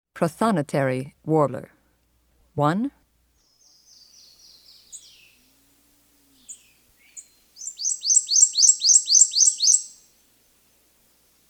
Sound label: Prothonotary Warbler Song; Magee Marsh; Prothonotary Warbler singing.